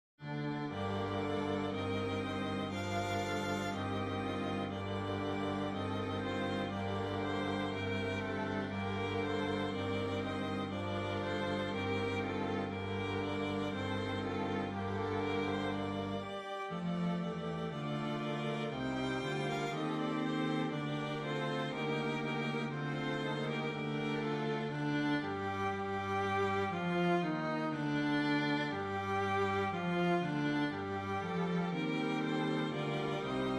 Strings

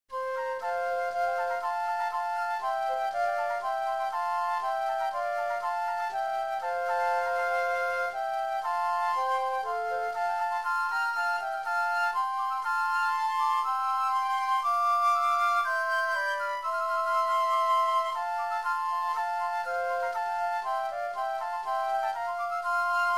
Flute

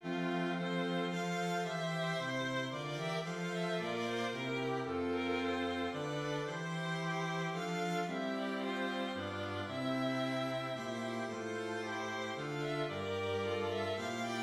STRINGS; Hark! The Glad Sound, or Richmond

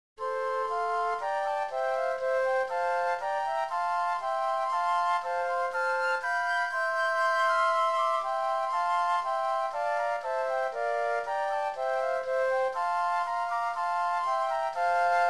Flutes

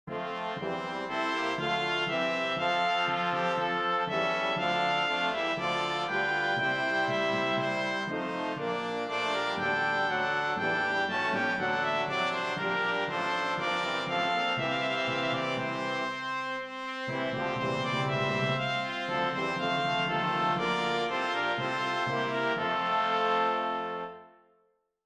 Hymn Brass

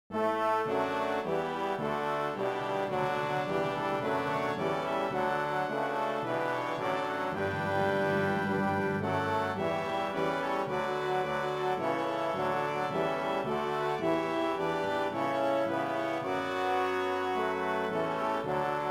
Hymn

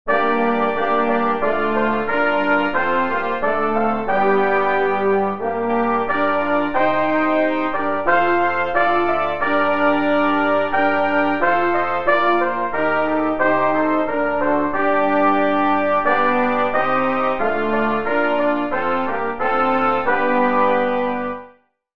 Hymn Brass

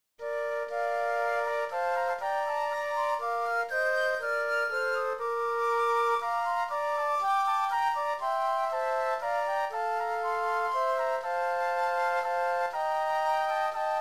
Flutes

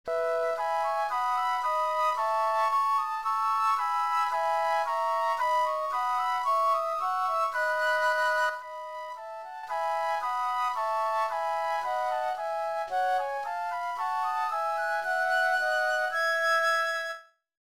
Hymn Flute

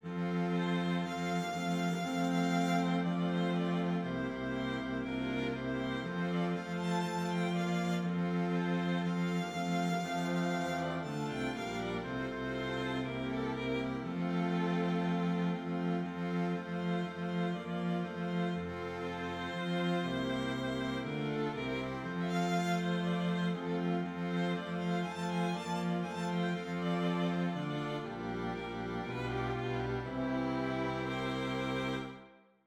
String; Hymn

